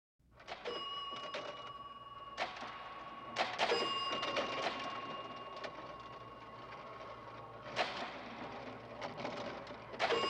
spielautomat mit glocke; Gluecksspiel

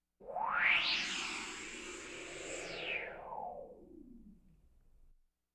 langsamer frequenzton auf und ab; Science Fiction

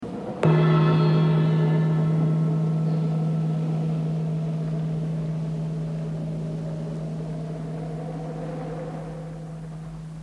einzelner Gong; Horrorgeraeusche

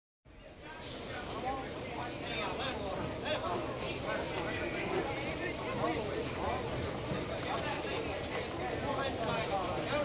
u - bahn in new york; Großstadtgeraeusche

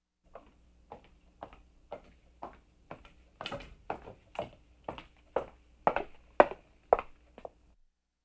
auf holz laufen näherkommen; Urwald

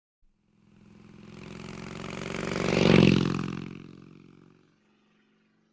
vorbeifahrendes motorrad; Auto-Zweiraeder

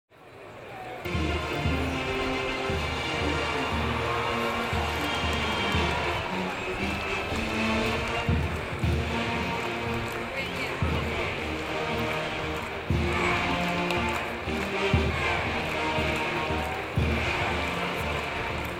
fußball - halbzeitpause; Sport

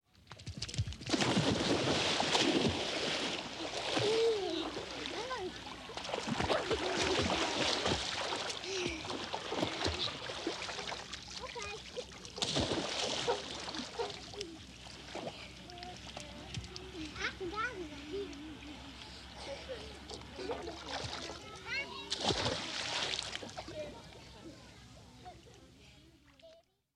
tauchende kinder im pool

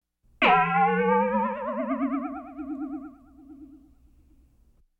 elektronenfrequenz, Science Fiction Geraeusche

